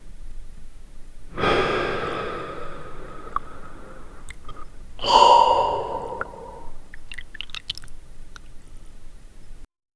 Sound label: slow satisfied sip and gu, beverage